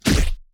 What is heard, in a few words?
Anime Super Whoosh Punch